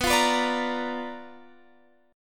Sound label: B Minor Major 7th Flat 5th, chord